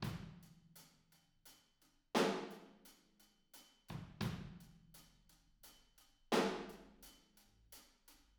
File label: Chorus, Room, Drums; Sonic Foundry (Sony Creative Software); Drums from the Big Room The Mixes; Demo Projects